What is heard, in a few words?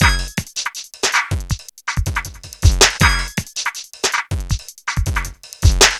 Breakbeat, Drums & Percussion